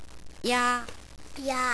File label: duck